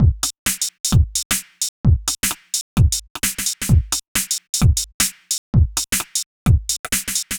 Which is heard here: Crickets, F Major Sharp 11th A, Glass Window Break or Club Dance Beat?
Club Dance Beat